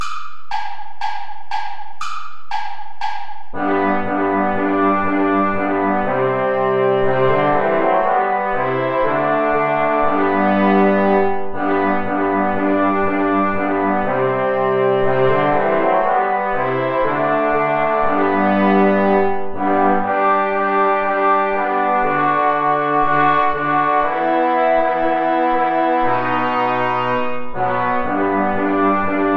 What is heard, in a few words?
play along without trumpet; steuerlein johann; mit lieb bin ich umfangen